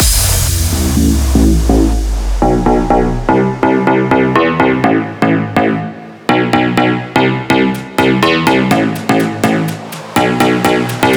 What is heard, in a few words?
Rocking 124 BPM E, Future House; Big EDM; Future House Tools; Construction Kits